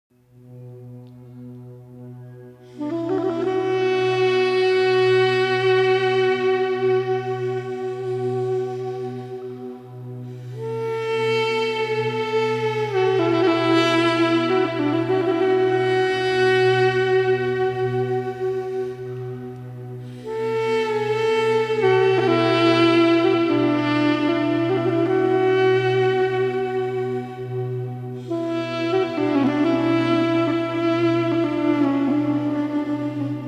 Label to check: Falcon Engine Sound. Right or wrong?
Wrong